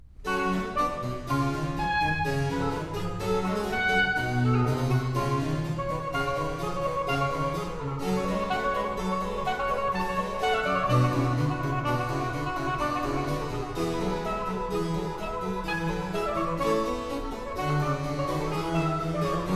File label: Sonata for Oboe and Bassoc Continua in G minor Allegro; RV 28; Antonio Vivaldi